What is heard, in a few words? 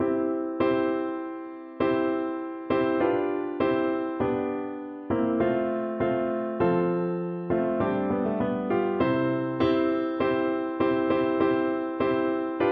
piano